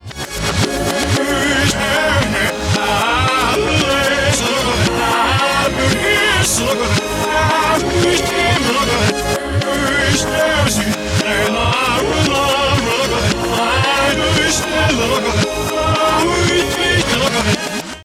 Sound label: song reverse